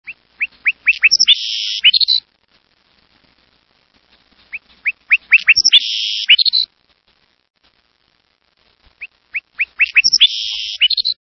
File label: Savannah Sparrow